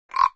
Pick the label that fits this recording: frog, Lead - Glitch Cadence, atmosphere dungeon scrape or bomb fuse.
frog